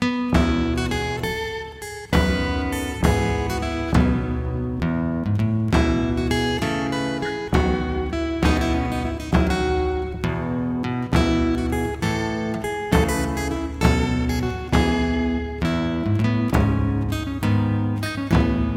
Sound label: Lute; Devil Whiskey